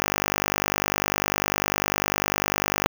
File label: 125bpm 5-4 1bar; MidroSync